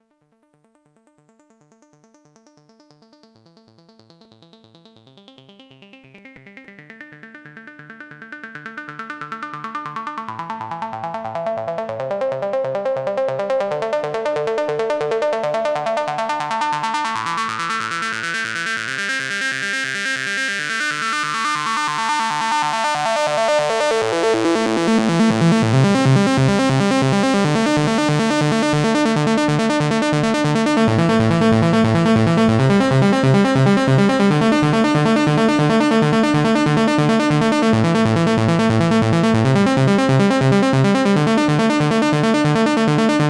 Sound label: lead synth